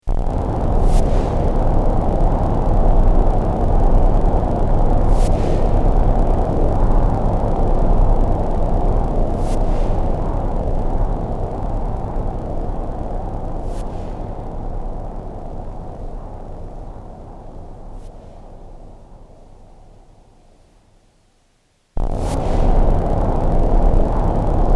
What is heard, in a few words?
Dreadbox NYX V2